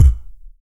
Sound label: VOC PERC, Vocal Planet Jazz FX BASS PERC